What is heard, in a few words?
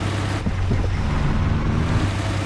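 gear shifting; razeni; Mafie 1 cz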